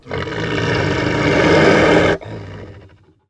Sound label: were growl; The Elder Scrolls III - Morrowind